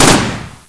bang, weapons; vengeance

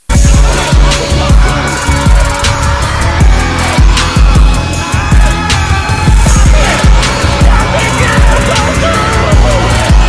drip car

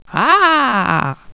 alert, dragon

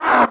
pain, krall; unreal

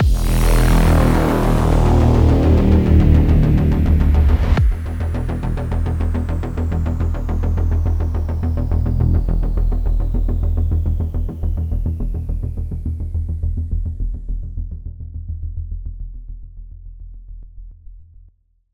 ending sting

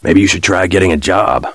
taunt; jc denton

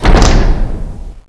heavy stop

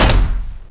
door stop

